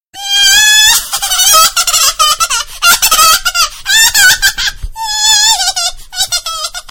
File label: crazy person laughing